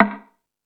Tool Kit, Drum Machines

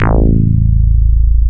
DIGI BASS; DIGI BS

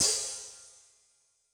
Splsh, HiHat; Yamaha DTXpress-II

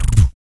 VOC PERC, Vocal Planet Jazz FX BASS PERC

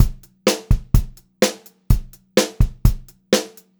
126 PO5, Pop-Rock Drumloops

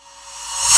reverse SPLASH, PERCUSSION; AKAI S6000 CD-ROM - Volume 1

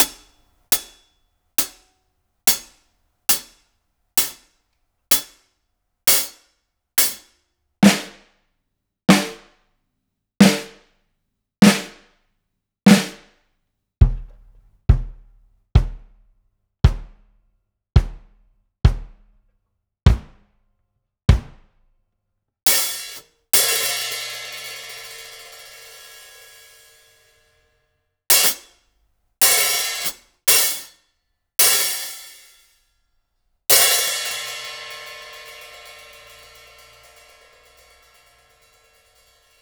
Acoustic room, Kit

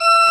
CHRCH, CHURCH ORGAN; Roland - LA Composer vol. 1

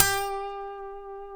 A, funk guitars

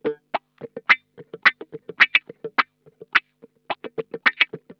SCRAPE, Funk Guitar; Zero G - Funk Guitar

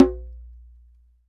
DJEMBE MUFF

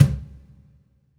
Cajun, Percussion One Shots; Essential Percussion

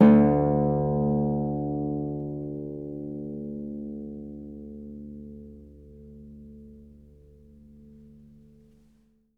Harp D#2; Miroslav Vitous - Percussion & Harp

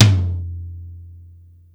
tom mono low, Yamaha Motif ES-Series; 300 Drum Machines

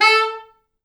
A3, Pop Brass; Best Service ProSamples vol.19; AKAI

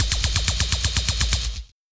easy uzi, Deep House 125bpm

